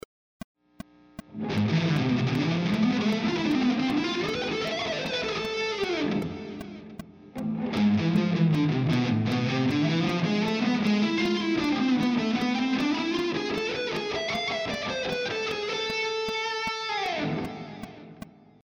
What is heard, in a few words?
phrygian dominant